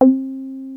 303 C4, TB 303; Hollywood Edge; Giorgio Moroder Rare Synthesizer Collection

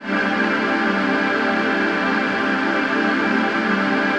PHASE PAD

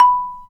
Bass Marimba

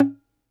DOMRA, Plucked Strings, Segundo Domra

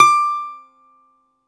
BALALAIKA, Prima Balalaika, Plucked Strings

